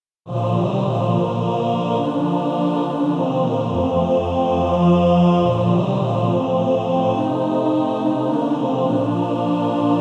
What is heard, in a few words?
Male Choir TTBB; Jesus Lover of my soul